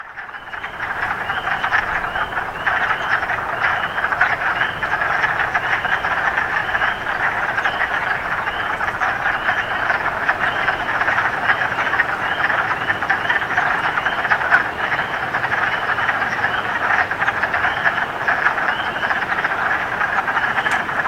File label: wood frogs; stereo